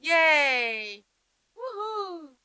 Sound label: Ya Whoo